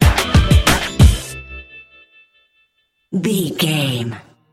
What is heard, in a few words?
funky time sting